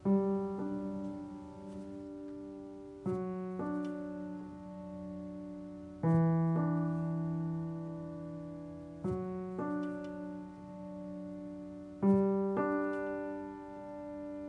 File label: Music Level Letting Go Piano Base